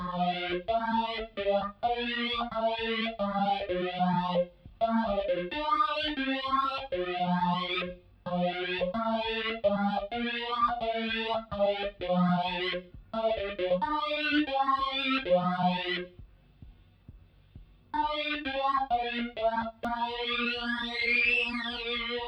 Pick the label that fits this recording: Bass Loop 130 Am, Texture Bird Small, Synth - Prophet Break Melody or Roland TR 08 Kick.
Synth - Prophet Break Melody